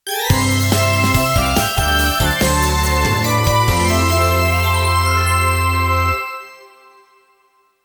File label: jingle normal